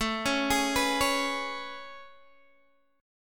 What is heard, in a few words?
A Major 9th A, Guitar, DADGAD